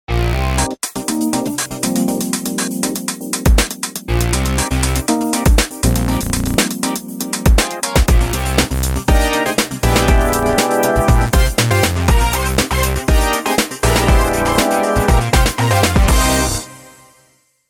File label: jingle